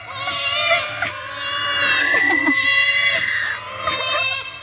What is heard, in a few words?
ghost